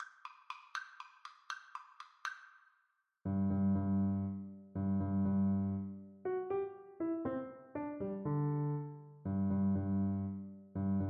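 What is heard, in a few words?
viola karaoke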